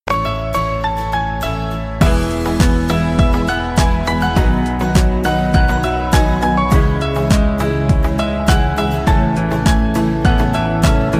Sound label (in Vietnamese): Nhac Chuong Piano Khong Loi